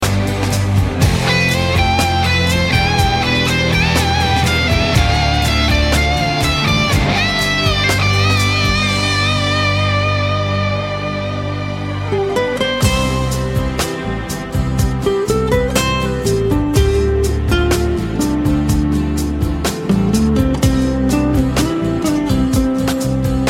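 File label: melodichnij rok, melodic rock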